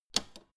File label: old button press jump out fast